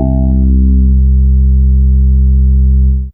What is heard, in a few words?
Digi Bass C3